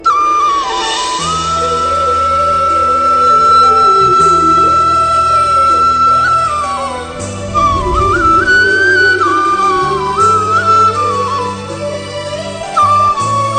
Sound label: krishna flute